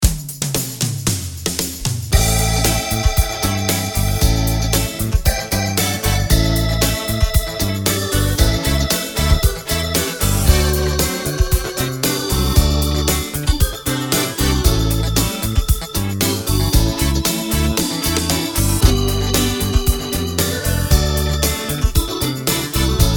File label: Blues&Rhythm; AjamSonic